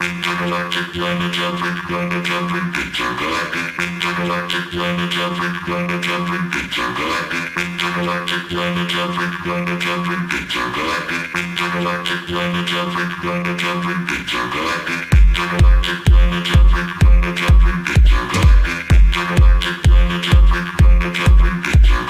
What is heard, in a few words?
Beat